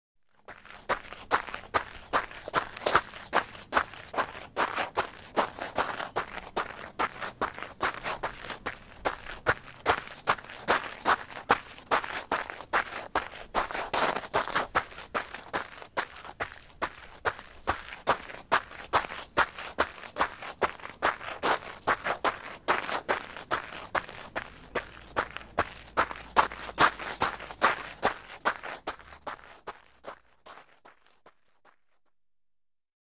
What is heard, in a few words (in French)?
Jogging